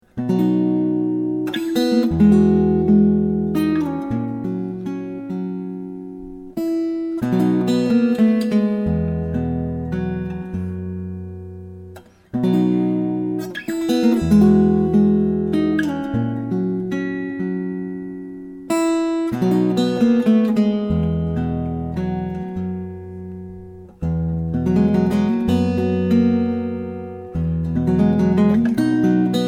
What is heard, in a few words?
archtop